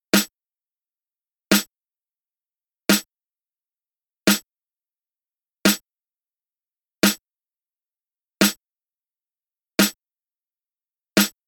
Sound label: SNARE